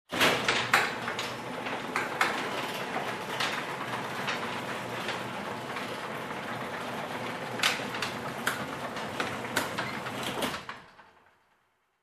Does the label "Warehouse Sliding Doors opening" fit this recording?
Yes